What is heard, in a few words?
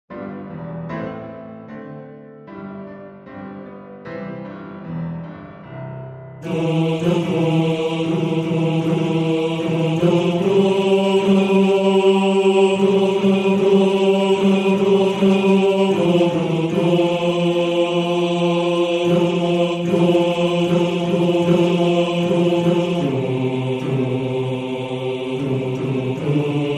Bass; Einsames Glöcklein; Bass 1